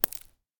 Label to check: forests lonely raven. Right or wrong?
Wrong